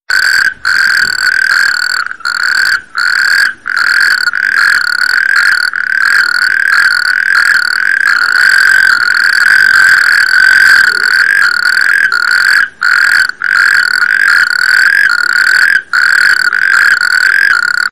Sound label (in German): Audio Kreuzkroeten